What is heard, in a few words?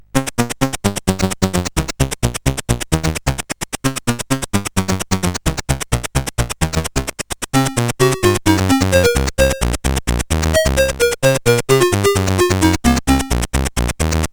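Mean Streets, MOS 8580 SID chip music, games; sid CSG8580R5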